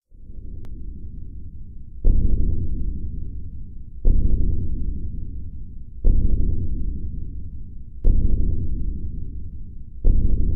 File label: Game Ambience; Slender